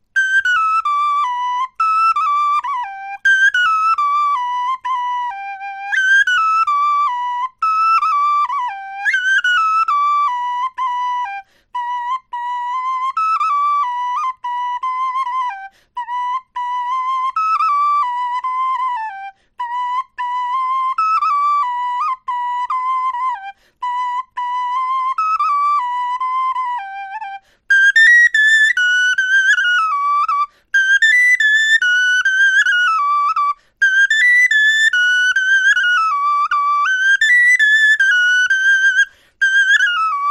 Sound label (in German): melodie